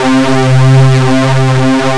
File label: Synths Note C